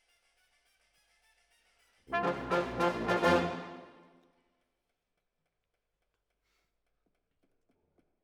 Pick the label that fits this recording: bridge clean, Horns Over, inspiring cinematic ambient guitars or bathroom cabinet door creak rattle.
Horns Over